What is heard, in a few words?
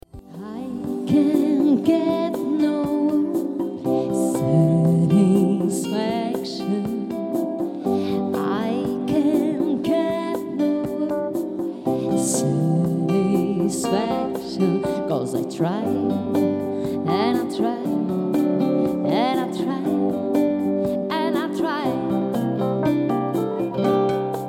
jazz version